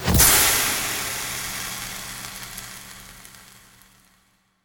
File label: acid start powerfull form sound; zoochosis; whale